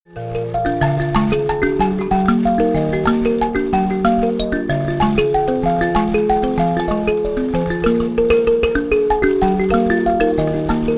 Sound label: mbira